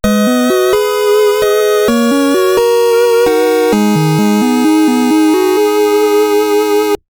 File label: Game Over; Widget; NES